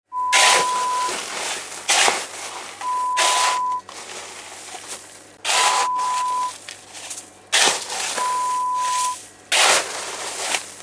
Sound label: Ice Box sound